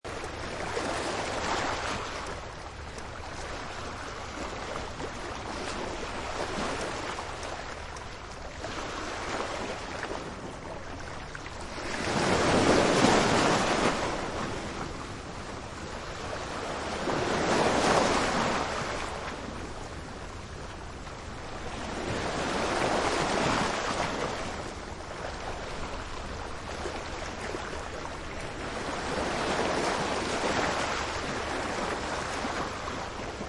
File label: waves